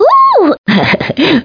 cackle

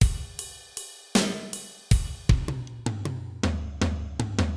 Fill, Blues; Pro Tools Blues

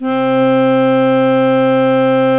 reed organ; gmplay

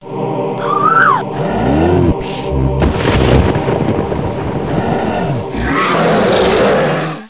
horde

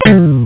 bow arrow; crazy 8's

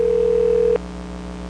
Ring; PhoneSoundz 1.0; Phreaking; Wardialers

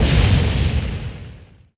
boom; BESTSHOT; noisybit

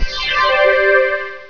level sound; Munchies 1.0.6

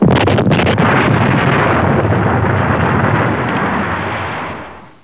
explod; thexder

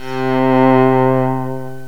Metal Pad, Synth pad; GMPlay

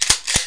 click; Doom2; darkarmy

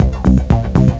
5 bar c-a#-c; Swoop 1.0.2; Swoop Music

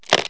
brief, office, equip; KABOOM! - Wild and Wacky Sound Software

